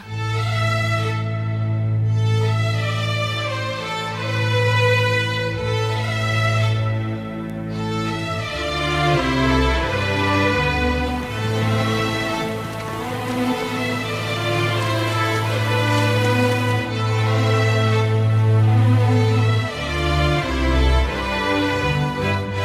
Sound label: Background Score Ringtone; 3 Movie